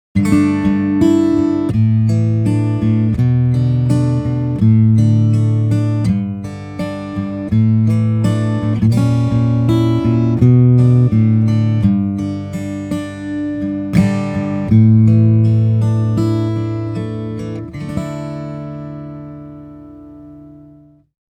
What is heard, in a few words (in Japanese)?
指弾き